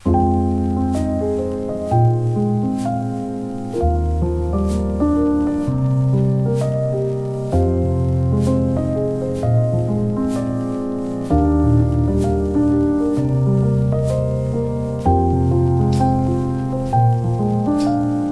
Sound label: Smoothjazz